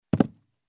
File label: Jumping barefoot on a carpeted wooden floor; steps